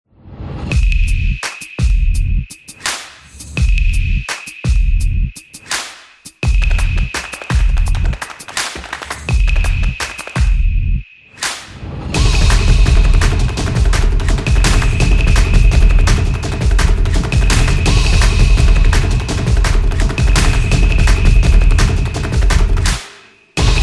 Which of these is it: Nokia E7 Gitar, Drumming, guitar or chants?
Drumming